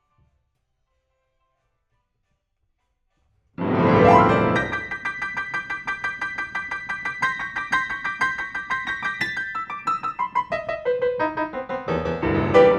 PIANO

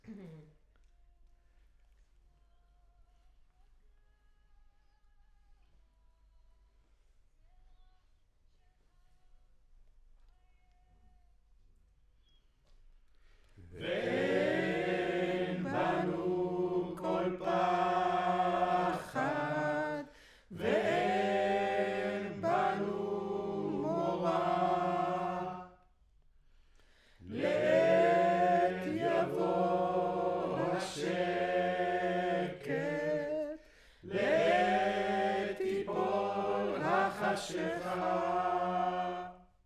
Choir

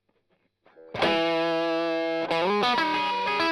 Strum Line

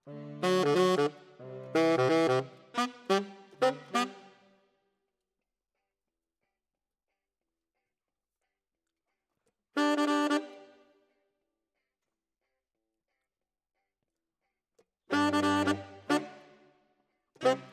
Tenor, RNR for piano

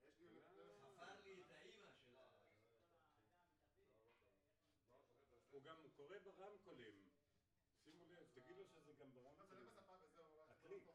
VOC

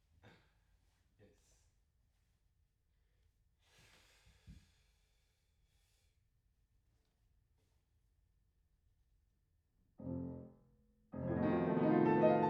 Piano Room

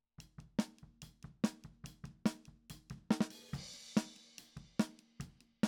Snare Top

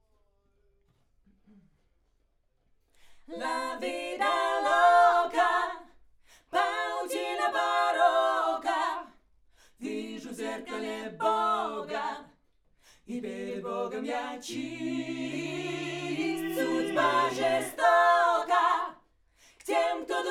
Backs AKG LEFT

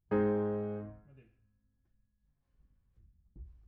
Piano Mono

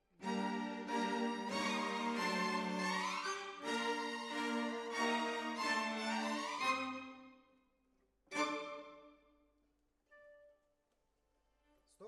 Decca, Jazz for piano

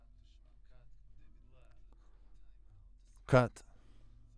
Voc OD

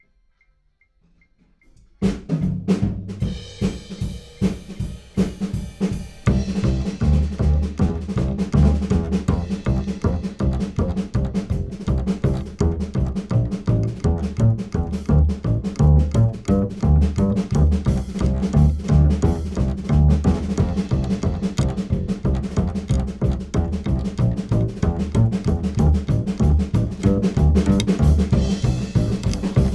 Bass

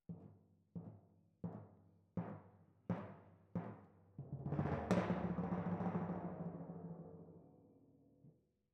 Timp Close

